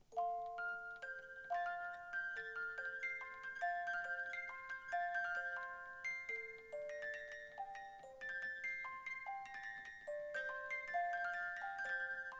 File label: Hornpipe